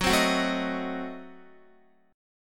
Gb Diminished 7th, Guitar; strummed; x,9,10,11,9,10; 4E,4A,5D,5G,5C,6F; JGuitar

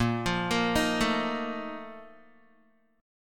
Bb Major 7th Suspended 4th, Guitar; arpeggiated

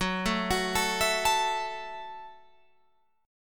Gb Minor 7th, Guitar Open F; Gbm7; arpeggiated

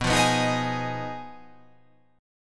B 6th Flat 5th B down, chord

